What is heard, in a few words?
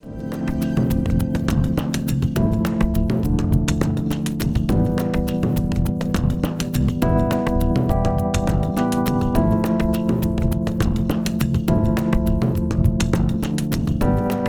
Percussion